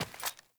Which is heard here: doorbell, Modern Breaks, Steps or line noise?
Steps